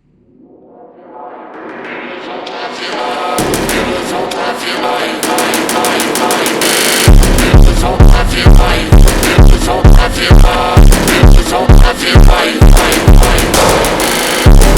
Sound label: Brazilian Phonk